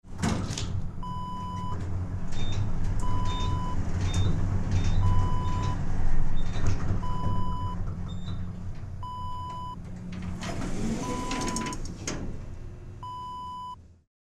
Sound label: elevator interior